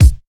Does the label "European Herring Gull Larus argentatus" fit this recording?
No